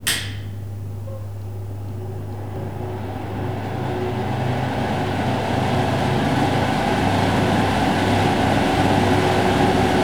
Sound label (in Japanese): kankisen